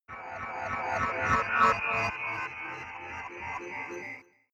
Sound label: digital stutter